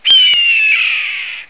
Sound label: Red tailed Hawk